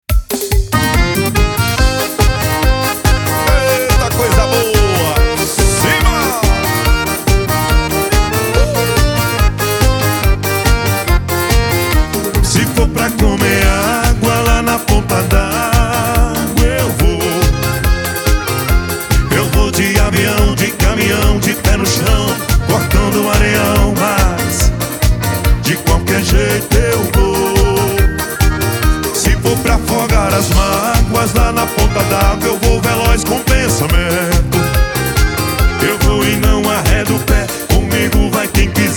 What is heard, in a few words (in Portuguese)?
forro; ponta dagua